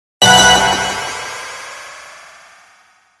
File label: Hit Robotic Shimmer Delay; Blastwave